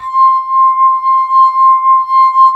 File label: SINGGLASS; AKAI S-Series CD-ROM Sound Library VOL-2; W+GLOCK